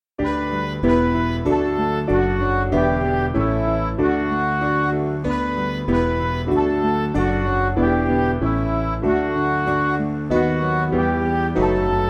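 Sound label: Music Backing Files for Churches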